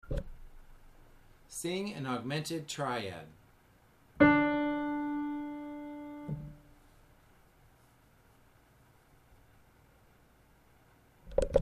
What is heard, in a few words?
Triad D Augmented